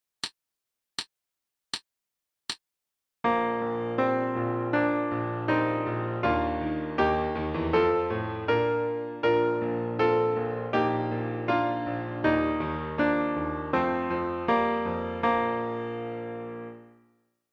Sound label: B flat Concert Quarter Notes